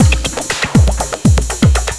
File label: Techno